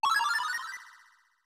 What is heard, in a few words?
Increase